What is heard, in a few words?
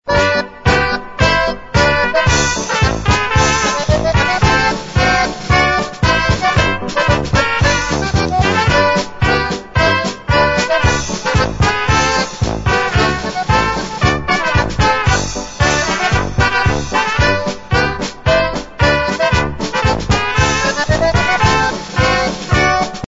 NEBRASKA POLKA